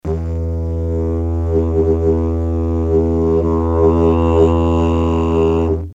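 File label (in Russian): диджериду одиночный долгий с вибрацией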